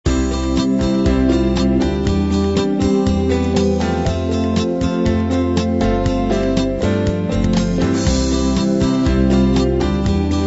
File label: unplugged 8beat end